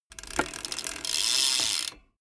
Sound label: bmx bike pedal once freewheel brake short